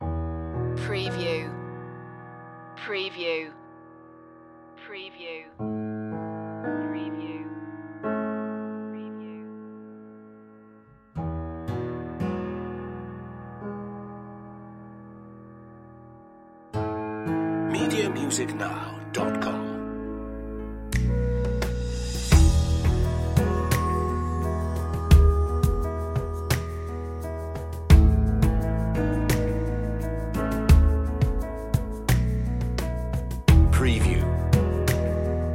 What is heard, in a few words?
beauty and calm